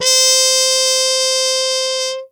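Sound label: trumpet C hi mute harmon no stem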